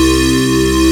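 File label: synth C3; Best Service Gigapack 1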